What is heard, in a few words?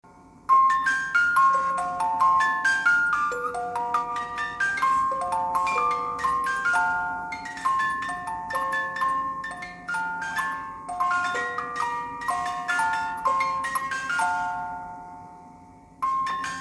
xmas music box